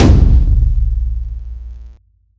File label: drum hit clap; osu!